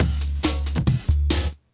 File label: Drum, Breakbeat